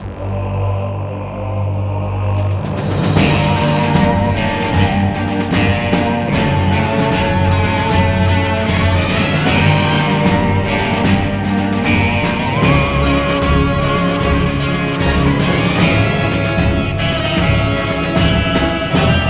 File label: Music